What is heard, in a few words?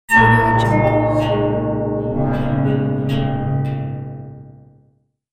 horror transition